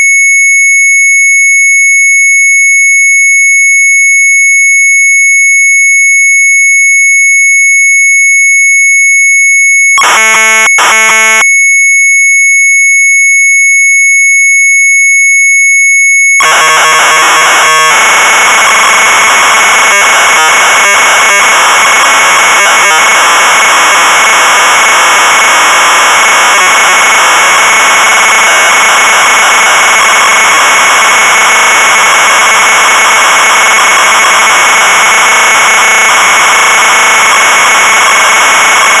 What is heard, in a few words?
MZ800 Tape; sharp; Destroy the City; retromelsarchive